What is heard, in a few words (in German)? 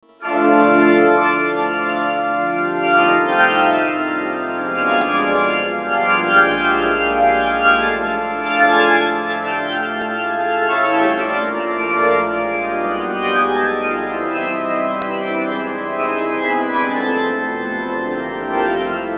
orgelstimmen